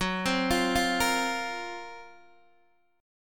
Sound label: F sharp Major 7th Flat 5th, Guitar, DADGAD